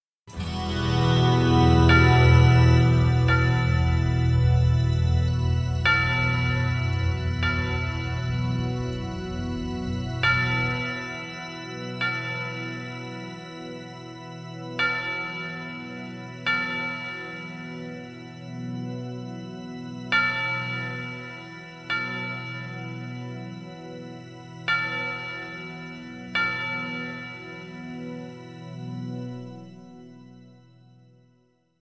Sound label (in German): 12 Glockenschlaege